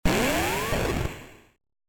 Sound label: Lightning Bolt; ringtones